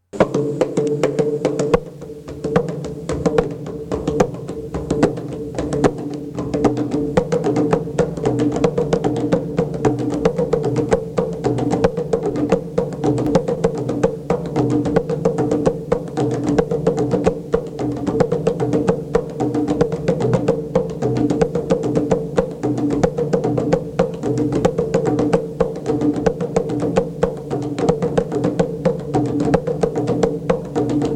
合奏（ニャウの太鼓合奏）; africa

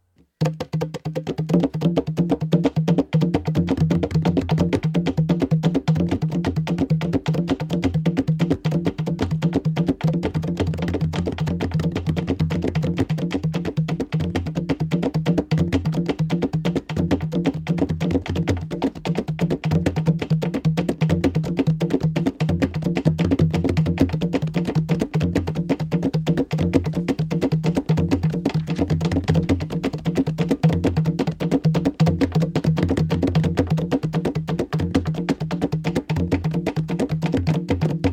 太鼓合奏曲ムウォコロの標準リズム型Ｃ; africa

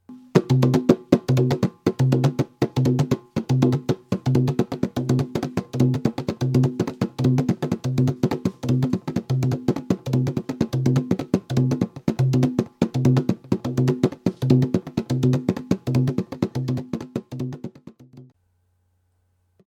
中音太鼓パターン; africa; 太鼓合奏曲

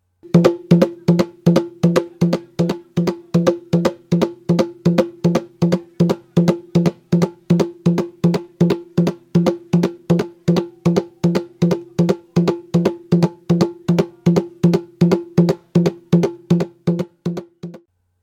高音太鼓パターン（太鼓合奏曲ムウォコロ）; africa

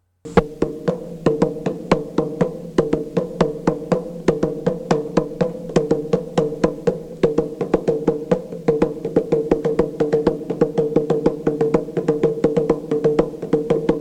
マスター・ドラムの即興演奏（ニャウの太鼓合奏）; africa

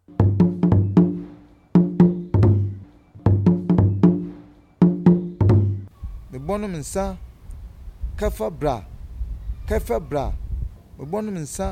メッセージの太鼓打奏; africa

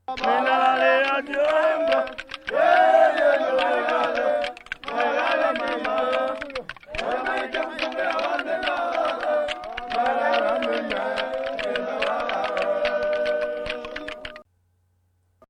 トゥワララ・クチホンゴの歌唱旋律; africa

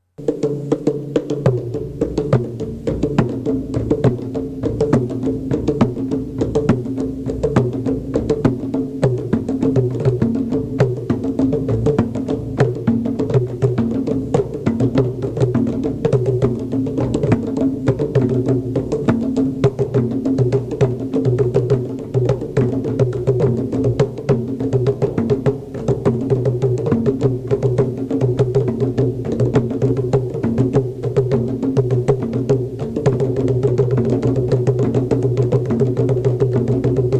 マスター・ドラム演奏付き合奏（ニャウの太鼓合奏）; africa

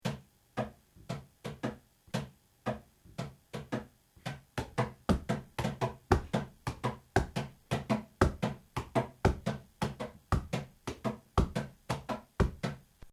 第二奏者の「入り」（ングワイの合奏）; africa

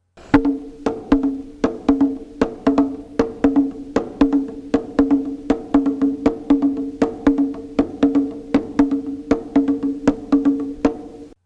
ケレム・パターン（ニャウの太鼓合奏）; africa

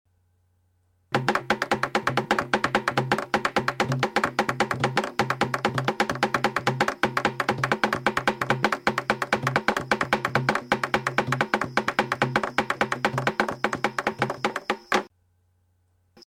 ミカカジ・パターン（太鼓合奏曲チヤンダ）; africa

